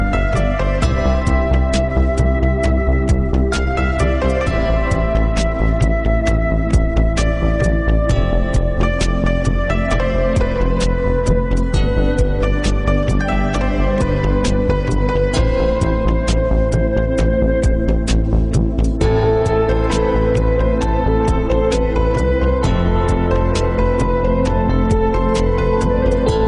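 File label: Instrumental, Ringtones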